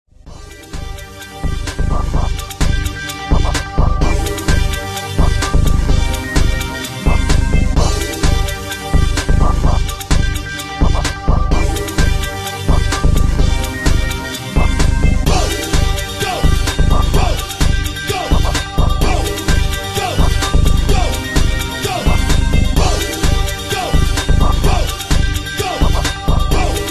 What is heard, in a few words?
Dance Pop